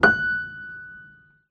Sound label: piano fs6